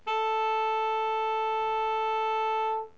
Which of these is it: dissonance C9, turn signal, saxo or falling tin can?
saxo